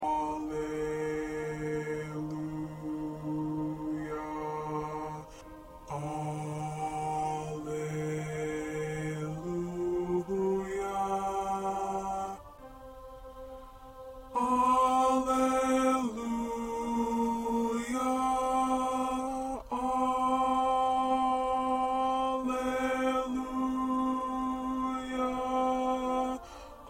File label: 1st Bass